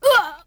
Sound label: hand hit; Star Wars Knights Of The Old Republic II; XBOX